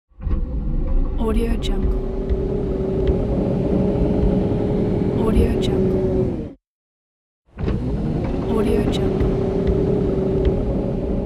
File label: hydraulic door hatch opening closing